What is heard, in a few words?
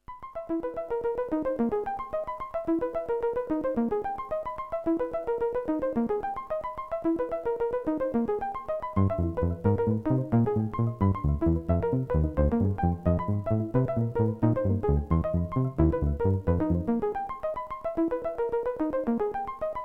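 maq16 polyrhythm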